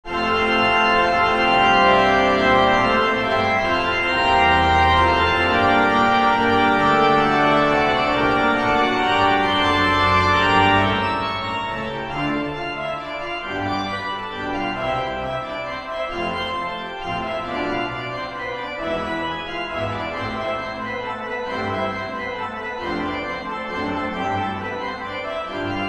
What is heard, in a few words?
grand chorus; lemaigre edmond